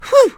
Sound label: surprised; Scrat